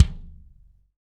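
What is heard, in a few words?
BD DRY, bass drum; Real Mega Drums; Best Service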